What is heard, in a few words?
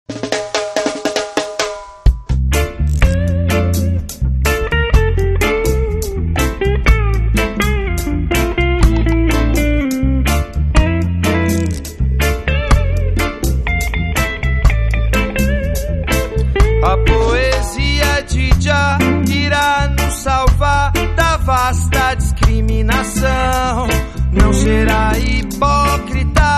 reggae